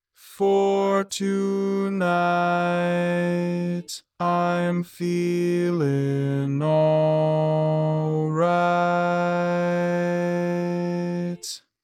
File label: Bass, barbershop tag